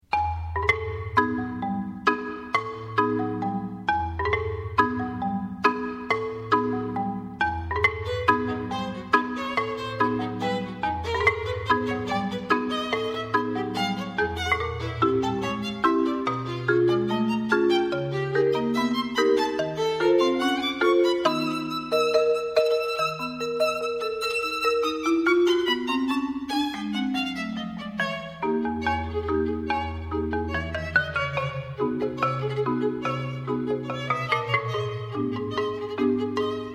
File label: tango